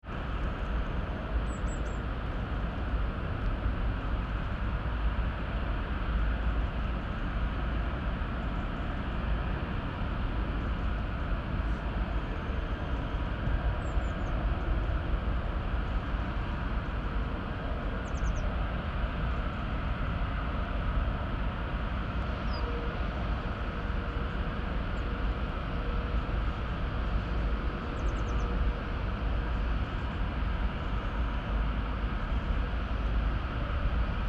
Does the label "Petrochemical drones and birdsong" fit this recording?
Yes